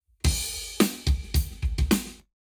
Drums, 0deg; Lab Wet

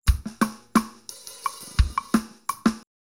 anechoic 0deg; cubical volume

